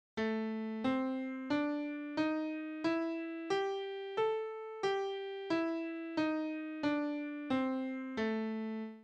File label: blues